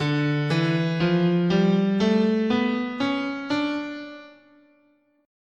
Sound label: D Melodic Minor, scale